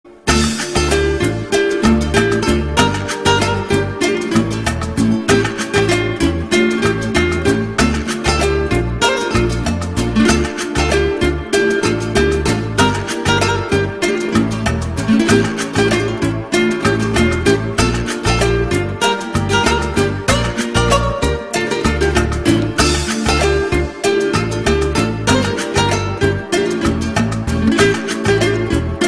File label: Guitar Moozic